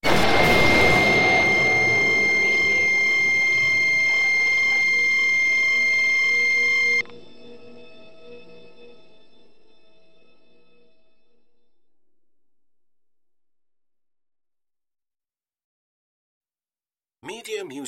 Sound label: sudden extraterrestrial appearance